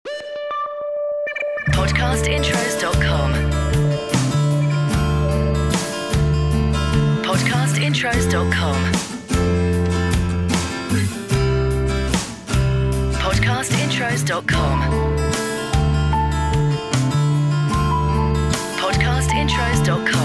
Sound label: CHILL OUT; Podcast Watermark